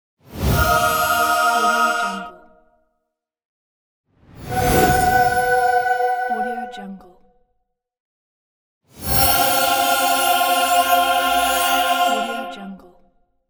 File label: holy revival